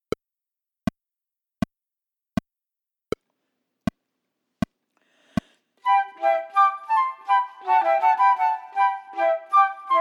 Bourée Partita in F Chamber Music for 3 Flutes minus 3rd part